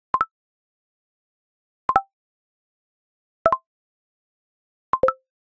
Arcade Click Positive

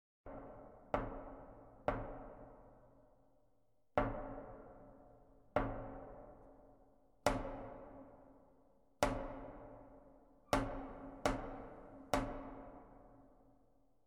Door Knocks, Scary Sounds; 1001 Sound Effects; Halloween Horror Sounds Effects

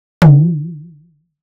Impact Dull Comic Wiggle Pulsating Hit; Hollywood Edge; sound designer's toolkit v2

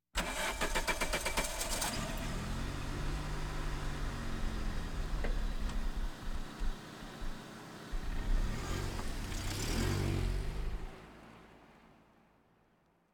Automobiles various

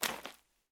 zombie footstep mud; DeadFright

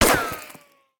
gun miss; Blind Drive

